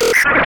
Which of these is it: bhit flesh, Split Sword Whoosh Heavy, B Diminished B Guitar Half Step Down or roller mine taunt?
roller mine taunt